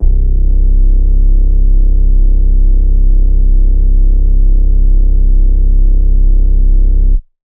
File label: Synth Bass C, Lo-Fi; HipHop; Melody Kits; 84 C#m; One Shot; Ghosthack; AC2024 - Day 5; Kit Glow